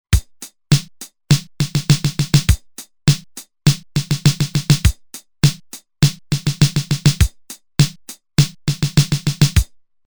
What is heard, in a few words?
Fill In 4-4, Roland TR-505; Factory Rhythms